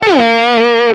network connectivity lost; jam; stereo

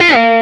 device removed; jam; stereo

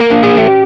window move stop; jam; stereo

